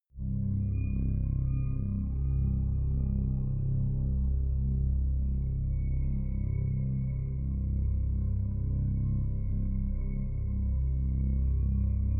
drone squeak and buzz

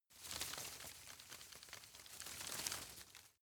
shake fall medium, foerster; berufe

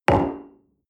coffee place table medium, restaurant cafe

